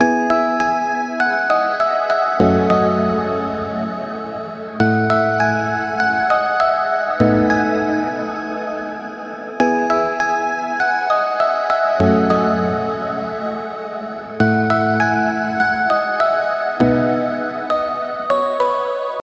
Bell pluck, chord progression, top catchy melody, E major, high reverb, 8 bars, 100BPM; Audialab EDM Elements